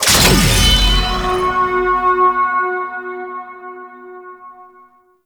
Case Friendly Captured; Tau; Twelve Minute War; Cases

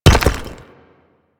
Nechro Mech Step Surface Rock; Step Surfaces

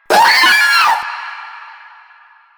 Runner Vocal Short Attack; Enemies; Warframe; Proto Infested

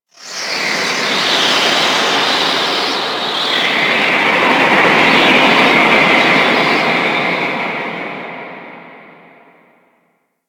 Moon Space Wind, Ambience; Warframe; Orokin

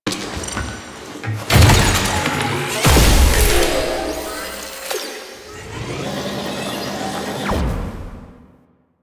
Deploy Scanner; Warframe; Entrati; Swarm

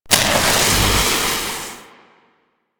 Proto Infested Maggot Spark Start

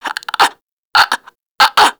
Infested Crawler Breath; Warframe

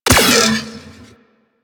Verglas Shot, weapons; DE; Warframe; Sentinel

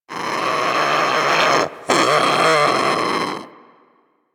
Sea Bird Vocal Snore; Warframe; Hunting Critters; Ostron Sea Bird

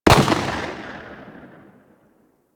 Weapon Enemy Tail Outdoor; Warframe; Weapon Enemy Tail Layers

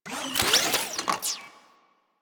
Drone Switch In; Warframe; Deimos; Cephalon Drone

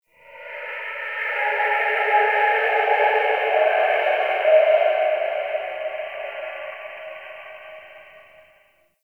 Spirit Cry, Crypt Ambience; One Offs; Champion Crypt

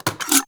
Fire Mech, Flame Thrower; Weapons; Warframe; Ignis Wraith

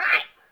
Sprout Vocal Grumpy; Friendly; Sproutfolk; Fey Hub Sprout

